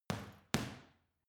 boxing punching bag side hook double medium; sport freizeit; boxen

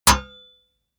metal impact sword plate

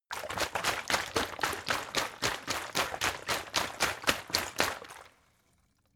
bottle water big shake slow; gastronomie; berufe

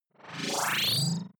sci-fi boot, swooshes swishes

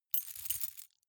key ring large rattle air soft; objekte; schluessel

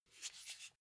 car flip key handling short

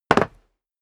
step sneaker concrete jump

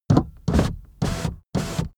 car window front open unsteady

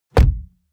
car bmw e46 interior door rear close hard; Cars

